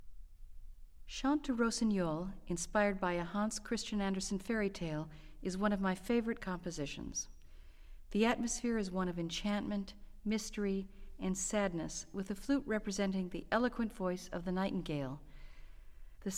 Orchestral Excerpts For Flute

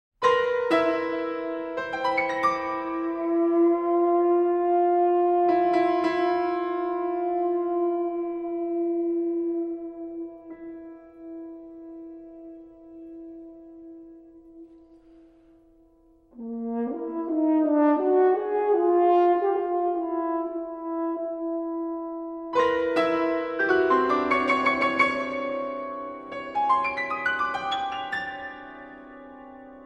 Dialogues For Horn And Piano

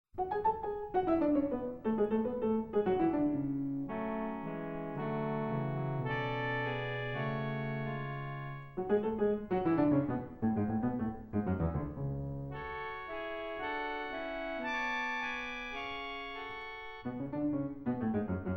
Scherzo For Piano And Harmonium

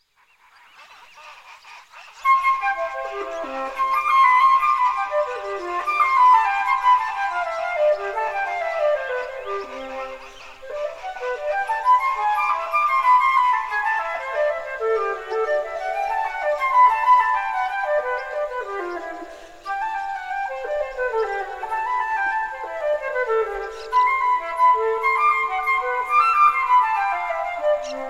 Suite in C Major, Courante; Suite No. 3; BWV 1009

